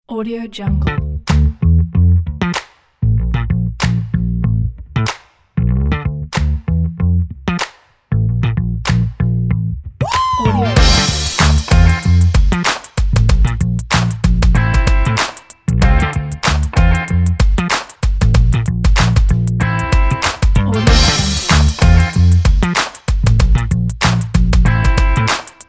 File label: funk